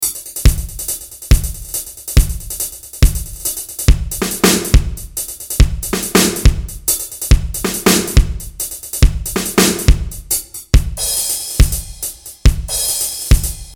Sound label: drums; MIDIbox SEQ V4